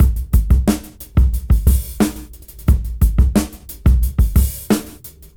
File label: Fat Break 89 BPM; AMG - Keith LeBlanc Phat Butt Jams